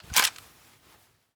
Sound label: bolt back; Rust